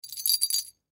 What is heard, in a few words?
Bell Small Jingle; Musical; Blastwave